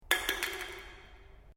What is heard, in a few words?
Can Aluminium Inside Drop; various metal cans; Drops (Sound Effects:Objects)